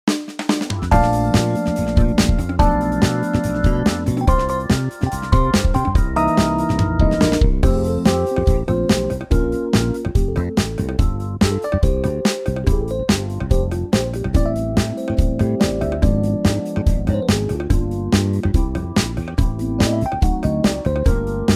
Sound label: smooth